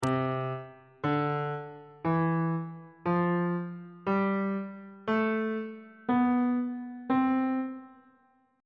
d double flat major scale on bass clef

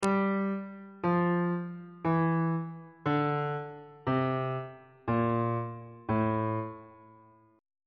a phrygian mode descending on bass clef

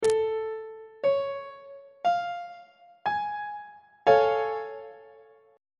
a augmented major 7th chord; treble clef

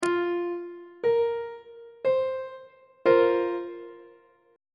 f suspended 4th chord; on treble clef

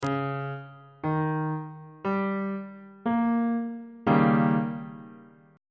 d sharp dominant 7th 3rd inversion

